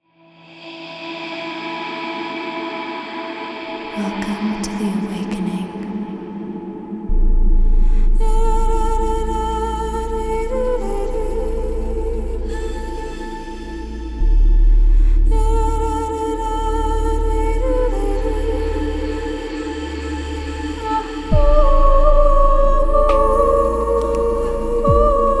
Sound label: silk vocal samples; silk vocal samples vol 2